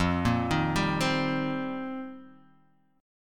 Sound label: F 7th Suspended 2nd Suspended 4th, Guitar Drop D; 3,1,1,0,1,x